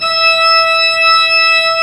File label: FULL ORG E5; AKAI S-Series CD-ROM Sound Library VOL-2